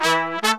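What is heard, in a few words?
brass 110 A minor, brass murga; Best Service ProSamples vol.14; World Grooves; AKAI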